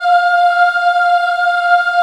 F4 CHI OH -L, Choir; USB Soundscan vol.28; Acoustic & Synth; AKAI

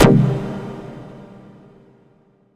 Stab C2, Club Techno; Zero-G; Stabs

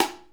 Side Stick, Percussion One Shots; Essential Percussion

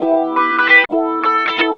GUITARS 135, House

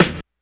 snare, Hardcore Breakbeat; Big Fish Audio; Hardcore Breakbeat Action; Rhythms 4 Life 151 Ebmin

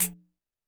percussion, Roland TD-20

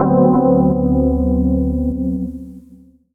Chords Tones; Equipped Music; Loungin House

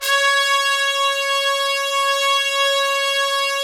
Trumpets, BRS TPS 2FR; Roland - LA Composer vol. 4

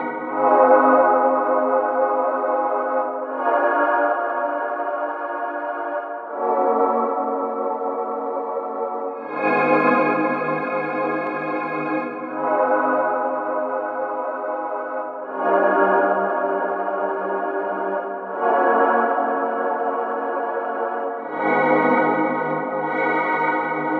Synth Chords; Eclectic Electronica

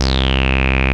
BASS, Analogon; A-BASS 2

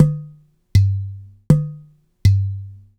Udu; Sonic Foundry (Sony Creative Software); Joe Vitale Latin Percussion

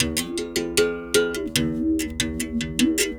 Tar, World Percussion

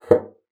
BASSOON; Xsample VOL-6

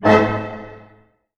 D# MIN, Orchestra, ORCH HITS; Sound On Sound; Peter Siedleczek's Orchestra

